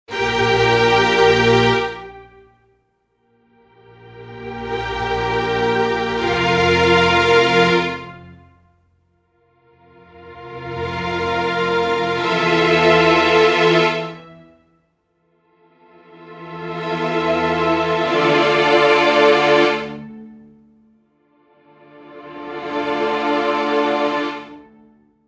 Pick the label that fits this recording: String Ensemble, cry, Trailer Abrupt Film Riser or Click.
String Ensemble